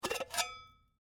Teapot Lid Open, Household, Teapot, Lid, Open, Full, Metal; Kitchen; BlastWave